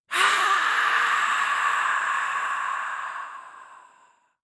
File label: Sci-Fi Alien Hiss; Science Fiction